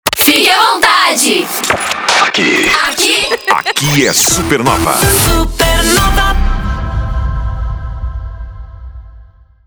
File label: INTERCOM